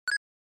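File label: Game Boy Action Item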